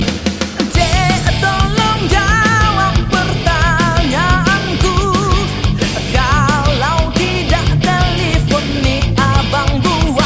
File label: Sms Kristal